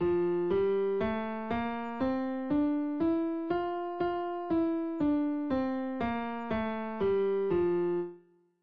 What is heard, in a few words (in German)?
Tonleiter F Dur, klavier; S.-36-Tonleiter-F-Dur-2